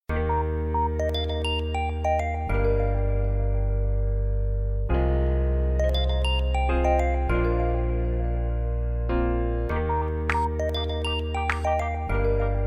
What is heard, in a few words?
sms; zain